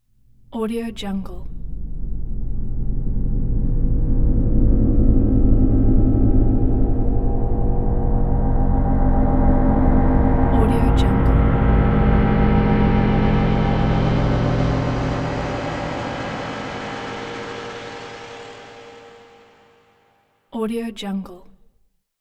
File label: space ship alien ufo lift off; hollywoodedge